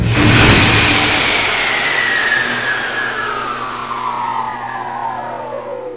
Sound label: fans down